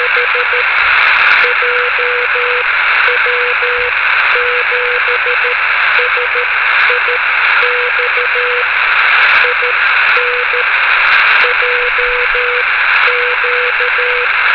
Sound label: beacon, VHF Radio Aurora; jw7six